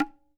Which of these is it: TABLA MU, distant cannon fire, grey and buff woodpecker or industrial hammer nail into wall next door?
TABLA MU